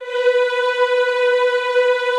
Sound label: B3, Classical Choir; Best Service; Extended Classical Choir